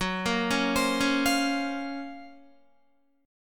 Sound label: Gb Major Sharp 11th, Guitar; GbM#11 arpeggiated